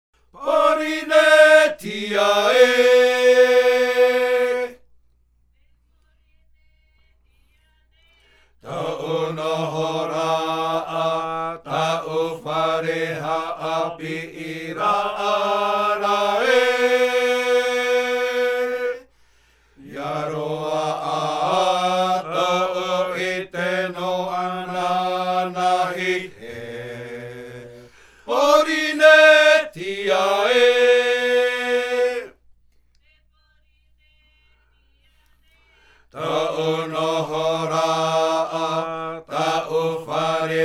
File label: Himene ruau, chants polyphoniques maohi